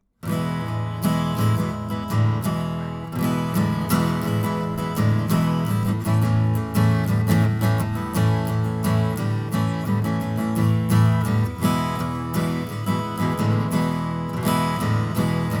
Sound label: Acoustic; Mogami